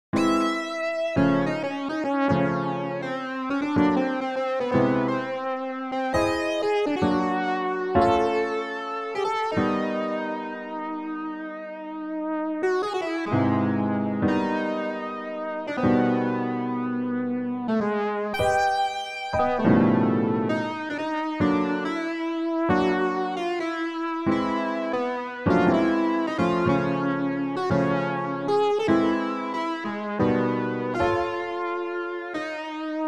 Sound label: big kid jazz